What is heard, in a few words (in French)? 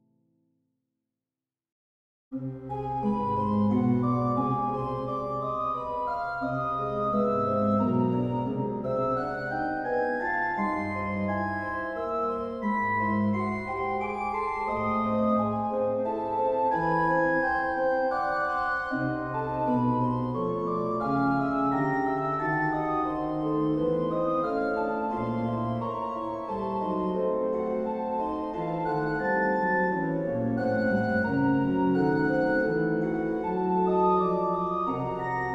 orgue; Andantino